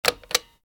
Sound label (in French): console